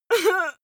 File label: female hit; soundpool